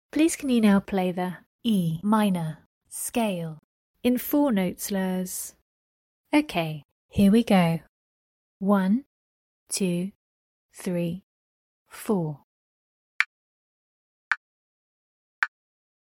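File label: E minor scale 4 note slurs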